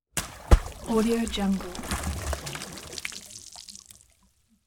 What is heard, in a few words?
small pebble dropped into water; sound-ideas